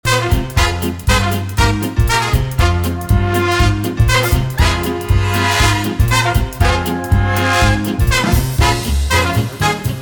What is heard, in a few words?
Shuffle Pick Up Changes Downtown Uptempo, tap; tap grade 5